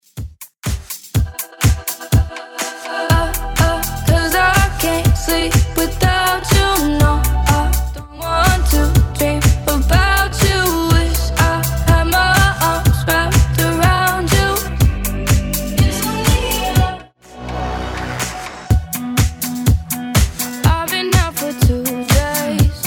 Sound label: Top40 Redrum 124bpm